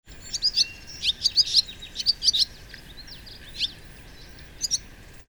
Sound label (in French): hirondelle rustique